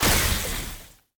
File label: enemy attack puddle cast; bloom minigame